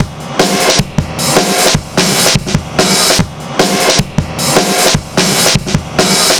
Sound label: 150, Drum Loops; Total Drum&Bass